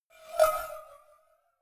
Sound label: cowbell scratch hit clank soft